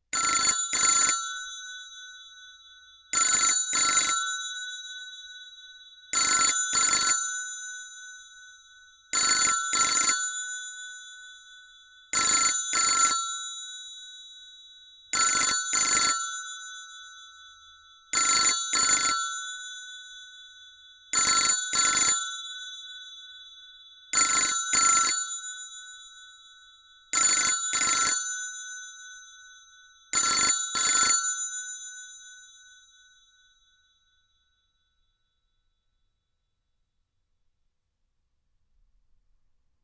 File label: analogue rotary dial telephone ring uk 25hz; fvtt sonniss gdc audio